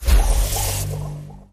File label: skill effect